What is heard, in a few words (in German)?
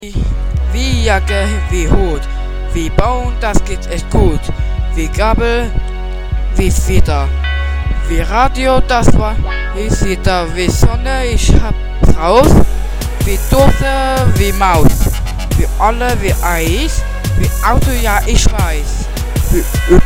Tabellen Rap